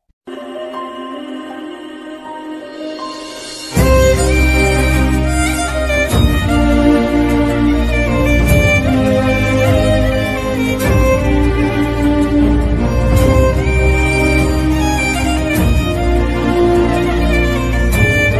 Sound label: Telugu sad BGM ringtone